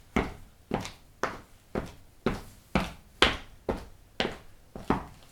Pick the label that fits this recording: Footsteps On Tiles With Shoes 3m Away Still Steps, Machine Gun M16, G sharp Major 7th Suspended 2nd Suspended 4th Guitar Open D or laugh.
Footsteps On Tiles With Shoes 3m Away Still Steps